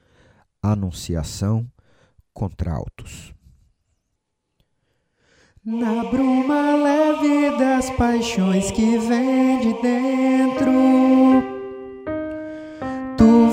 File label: Alto